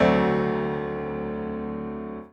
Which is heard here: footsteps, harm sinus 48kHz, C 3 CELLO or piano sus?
piano sus